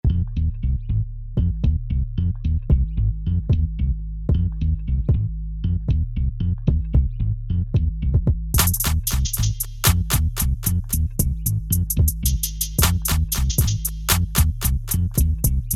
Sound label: Hip Hop